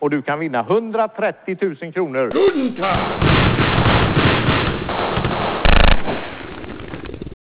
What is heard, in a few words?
guns